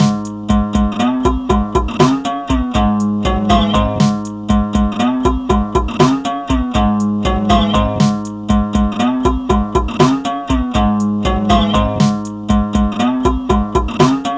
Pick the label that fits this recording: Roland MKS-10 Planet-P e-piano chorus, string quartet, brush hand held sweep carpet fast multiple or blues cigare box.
blues cigare box